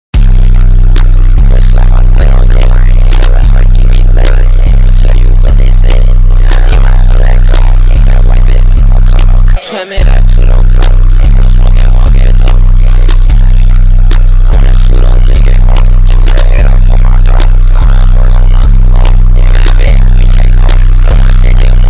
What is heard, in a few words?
Bass Boosted